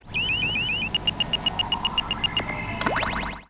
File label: tri beep